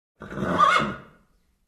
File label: Horse Whinny